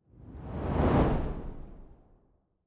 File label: whoosh